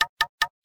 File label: Knock; LG; Optimus LTE3